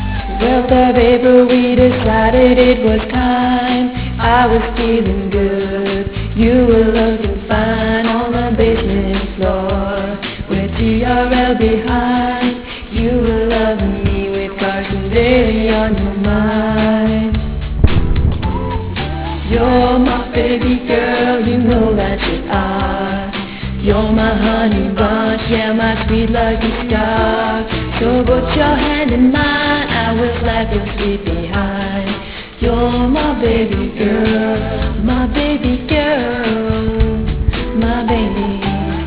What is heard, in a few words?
karaoke